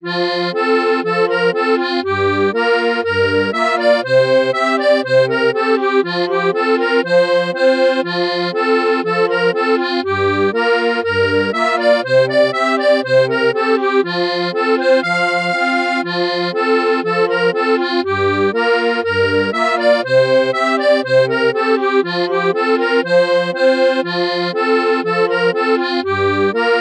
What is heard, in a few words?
schottisch, folk